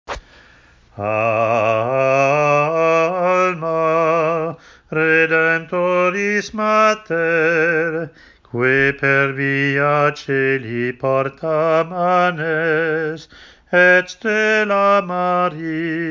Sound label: alma redemptoris mater simple tone, Latin hymns; Alma Redemptoris Mater – simple tone (Advent to Feb 3)